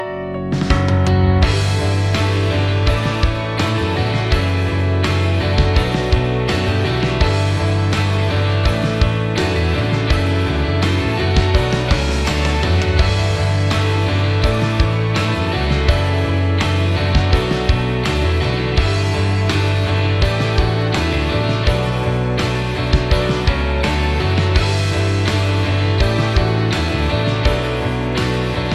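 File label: background music; event scratch 2024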